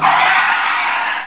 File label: Menu Tire Over